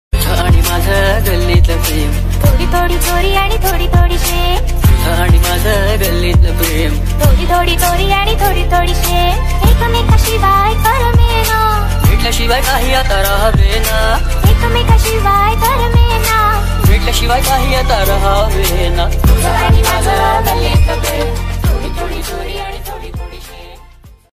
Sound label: Marathi love Ringtone